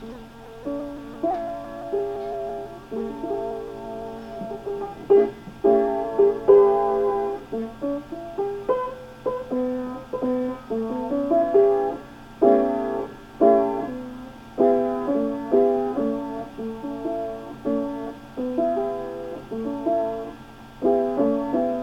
maj7 9 chord prog

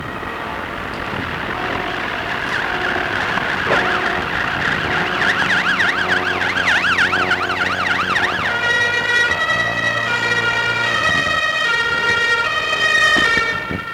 sirens

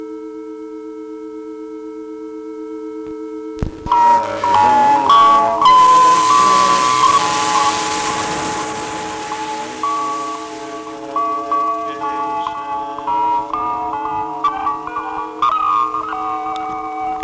wash volca

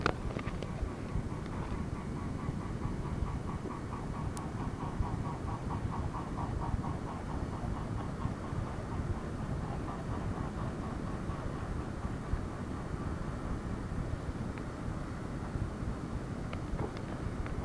bird wing

